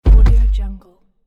cinematic falling body

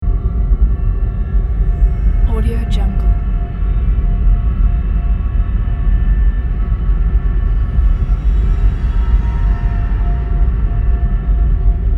deep room industrial background loop

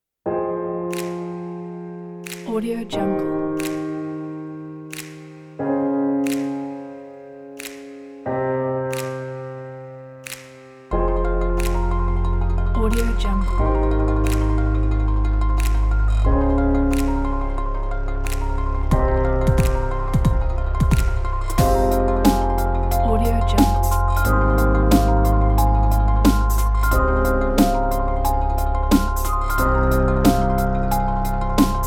cinematic elegant electronic; yugopratomo

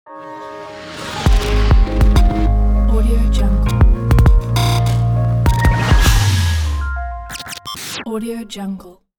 stylish logo; berrydeep

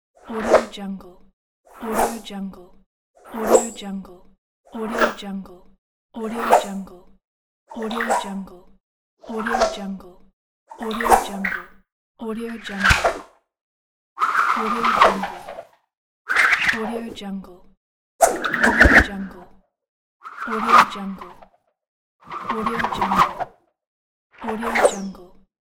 powerful spinning whooshes fly by

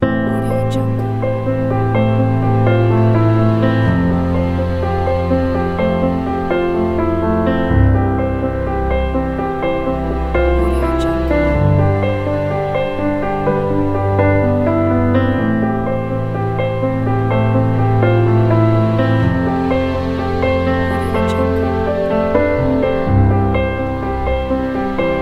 sentimental piano

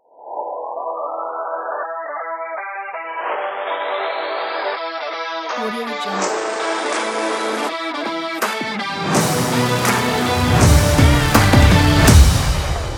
action sport rock kit

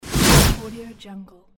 woosh

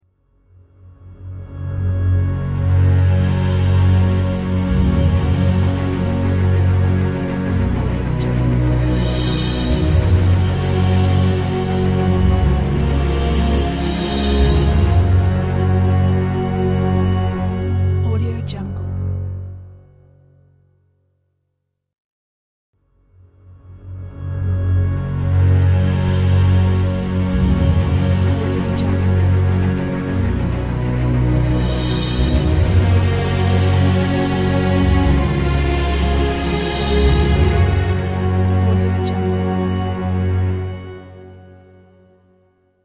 noble symphonic logo

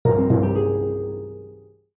musical theme adventurer special item; mobile game; esmteam

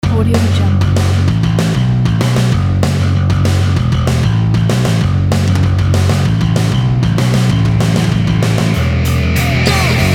hardcore punk

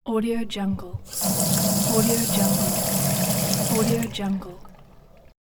faucet opening

